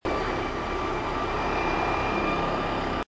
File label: air ambulance crash; north circular